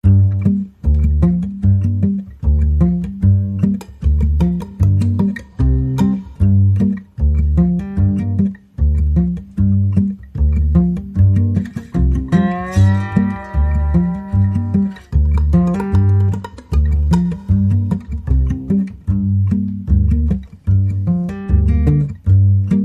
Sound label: Kid Guitar